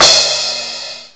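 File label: CRASH CYMBAL; CYMBALS; CMI Sound Library Rev 1.3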